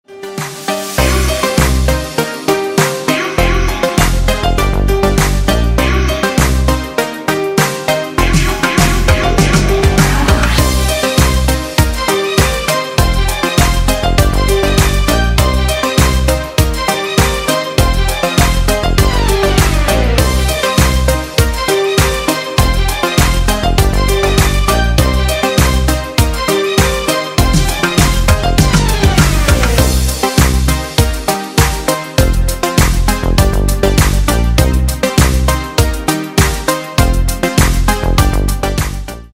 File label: piano fantasia cover